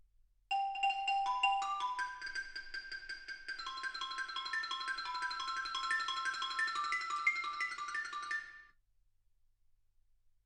xylophone

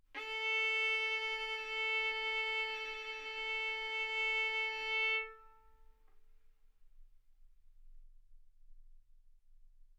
Violin arco A4 B4; mf; sul A; stereo

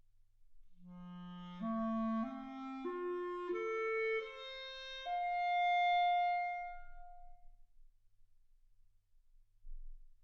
clarinet

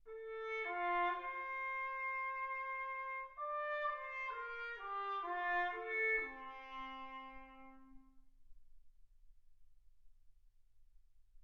trumpet

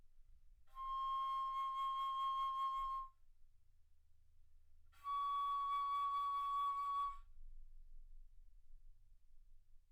Bass Flute ff C6 Db6

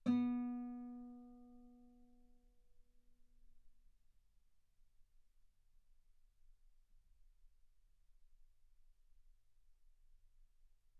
Guitar ff B3; sul B

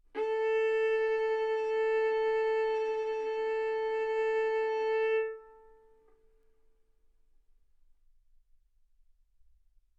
Violin arco A4 B4; mf; sul A; stereo

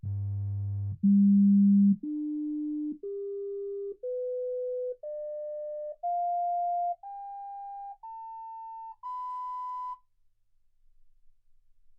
sinus tones 48kHz

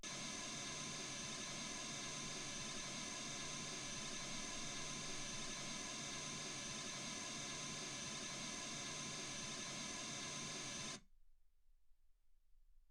mls16 48kHz

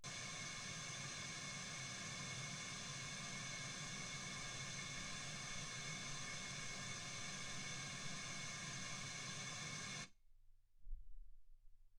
wgn

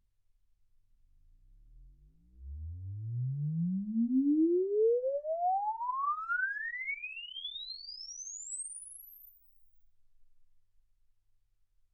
exp swept sinus 10Hz 24kHz

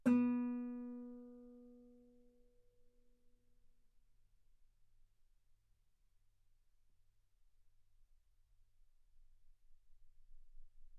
Guitar ff B3

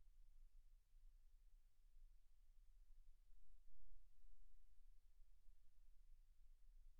silence 48kHz

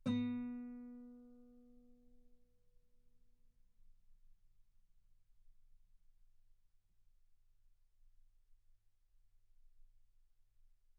Guitar B3; ff; sul B

